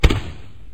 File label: boom, fireworks